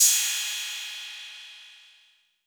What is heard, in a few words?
Roland 808 CY